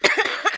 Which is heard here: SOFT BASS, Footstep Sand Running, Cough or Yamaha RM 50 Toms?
Cough